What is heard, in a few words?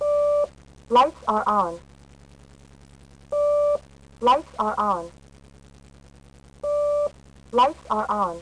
warn; WAV Sound Effects; transpo; cars